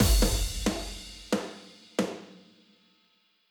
Loop End; Legend of Keepers

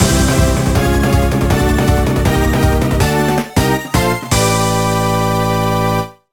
Fanfare

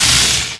Damage Ice

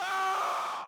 Death Voice

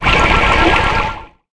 Water

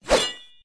sword jump attack; player; unsighted

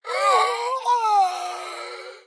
Ratcaller Death

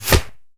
Monster Generic Foley Hit By Bullet

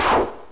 Shot fire; Touhou Jitsuzaisou Dream Logical World

